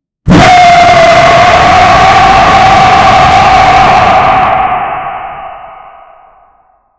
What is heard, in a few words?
monster